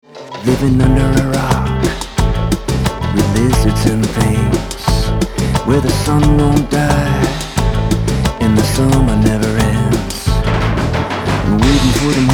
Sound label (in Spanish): Cumbia Del Polvo